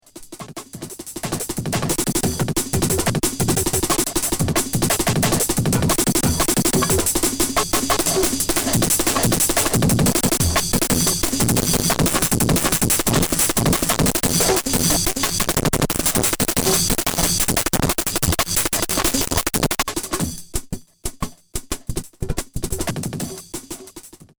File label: breakz